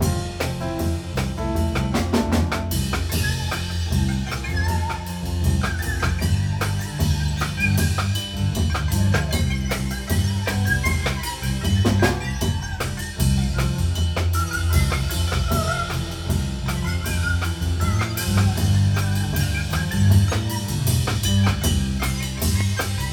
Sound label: drama music